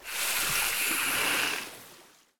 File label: snakeboss idle, monster